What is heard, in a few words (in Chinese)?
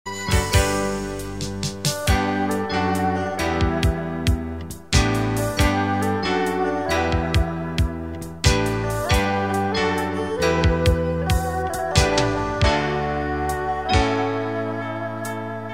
广告片音乐